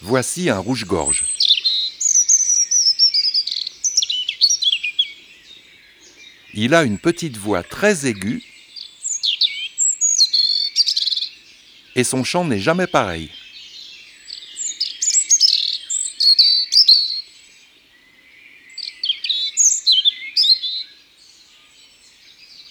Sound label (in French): Rougegorge familier, Un jour dans la foret; Imagier